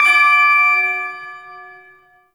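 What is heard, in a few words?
Ersatz Shawzin Octave Three D; Music; Warframe; Duviri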